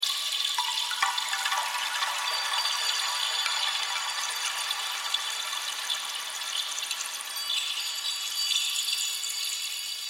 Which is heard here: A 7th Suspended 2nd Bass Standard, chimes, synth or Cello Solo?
chimes